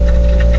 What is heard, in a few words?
machine loop